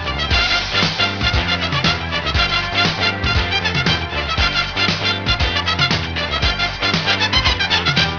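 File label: shout trumpet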